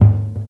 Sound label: 60s tom drum G sharp key, Royality free tome drum sample tuned to the G# note. Loudest frequency: 138Hz